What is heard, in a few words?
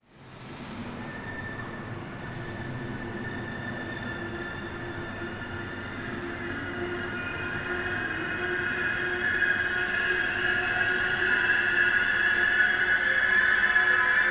train, hauptbahnhof